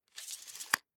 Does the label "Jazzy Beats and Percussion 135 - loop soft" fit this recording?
No